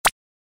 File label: Notif